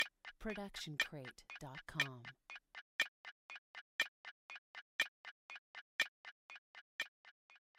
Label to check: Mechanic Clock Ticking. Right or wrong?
Right